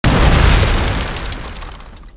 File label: Remote Mines explode; Dota 2